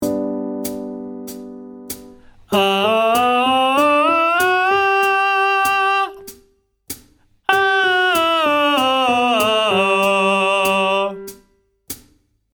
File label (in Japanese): ボイトレ ひっくり返らない